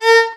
VIOL A#3, VIOLON, Arabian Traditions; USB Soundscan vol.43; AKAI

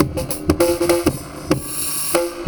97 bpm, Breaks; CATCH 22; 90 s Sample CDs; Planet of the Breaks

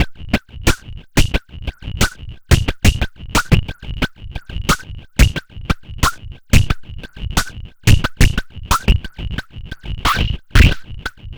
FUNKI; E-MU Producer Series Vol. 6 – Analogia Project

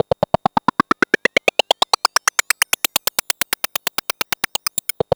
laughing VCS, VCS beats; Zero-G Ambient 1

